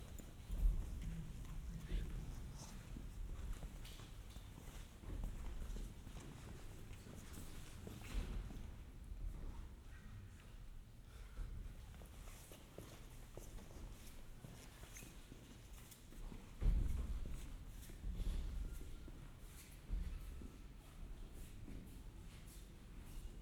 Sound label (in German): Kirche; Lexikon-Roman